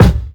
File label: sharp kick drum sound c sharp key; kick drum samples